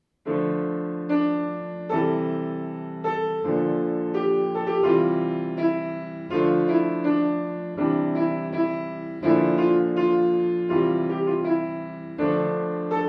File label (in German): Variationen Improvisation, Klavier